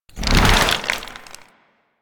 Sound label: Infested K Drive Mount Dismount